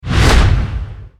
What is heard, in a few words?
miracle extend